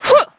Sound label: EFFORT; VOICE; Pulse Racer